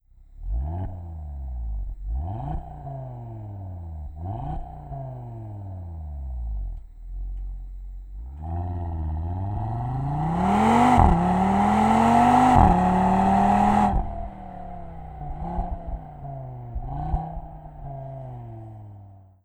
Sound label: Audi RS3 OPF SERIE; Aerotechnik